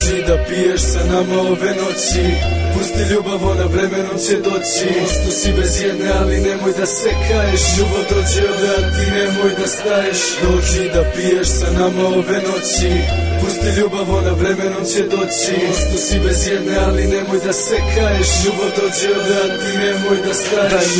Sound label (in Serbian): Srpski Hip Hop